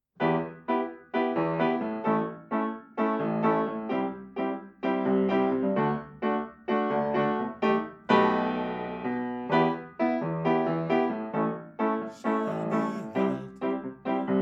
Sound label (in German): Klavier; sing human rights article04